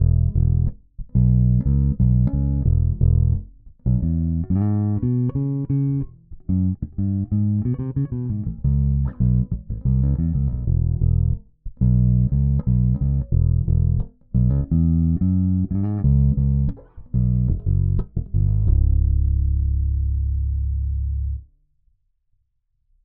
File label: Ibanez EHB1006MS Finger Neck Low Mid Boost High Cut Active